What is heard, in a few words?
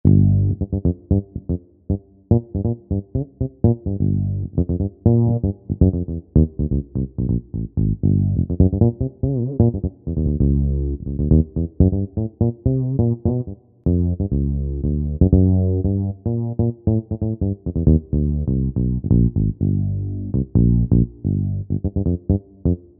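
Bass Thump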